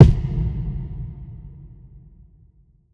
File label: Drum Kick with Howling